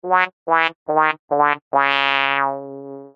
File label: cartoon fail trumpet wah; zapsplat